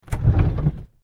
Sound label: drawer open